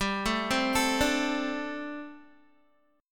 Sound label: G Suspended 2nd Suspended 4th, Guitar; Gsus2sus4 arpeggiated; 14,12,12,14,14,x